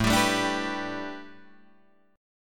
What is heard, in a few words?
A 9th Suspended 4th A, Guitar DADGAD; chord